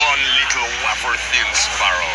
Sparrow

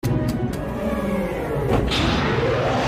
Falcon failed start

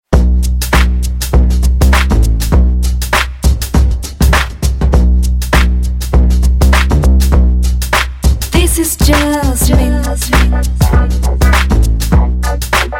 Slow Sms Tune

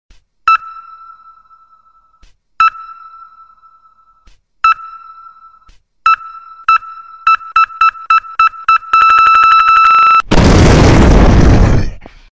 Blip Boom

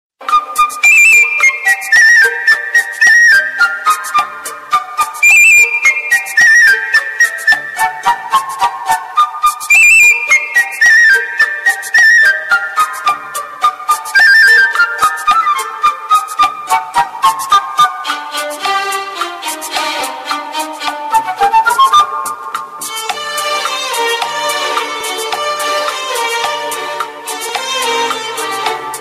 Nice Flute

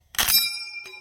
M1 Garand Ping

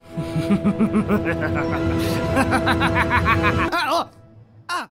Evil Laugh; Tord

